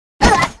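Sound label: Npc Ship red harpy damage; Fly For Insanity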